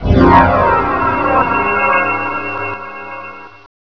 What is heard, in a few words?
Time Warp; Loony Labyrinth 1.02 Demo; Arcade